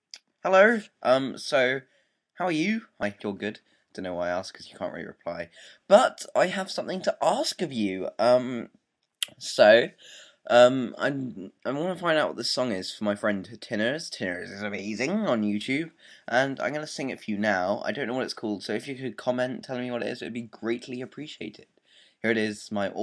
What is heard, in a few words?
oooh ahh